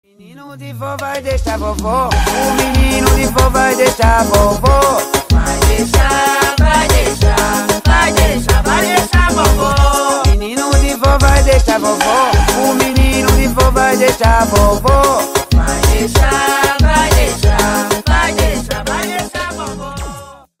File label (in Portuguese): PISEIRO